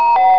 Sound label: Comm Beep; Missions of the Reliant II